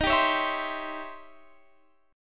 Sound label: E flat 13th Flat 9th E flat; strummed